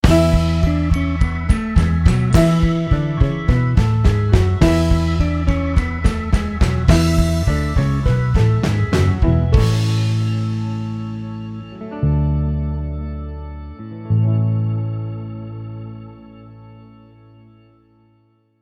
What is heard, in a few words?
Dock Rock Sting; ВидеоМОНТАЖ 23.0; ams music library